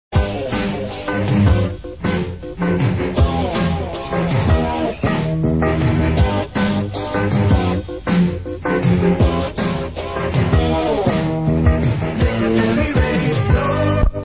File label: tune piccadilly radio